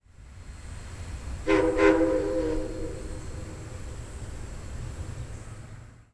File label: Toot Toot